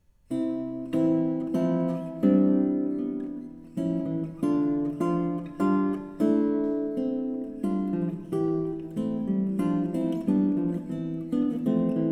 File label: Studios for the Spanish Guitar, Andantino; Op 6 - No 8 in C major; Fernando Sor Early Works